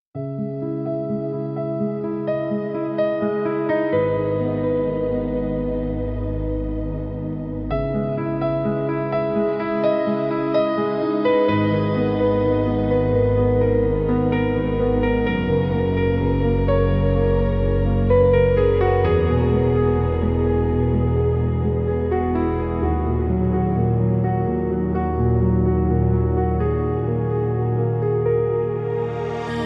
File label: trance orchestra